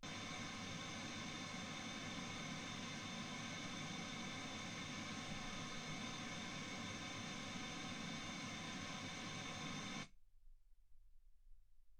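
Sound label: white gaussian noise 48kHz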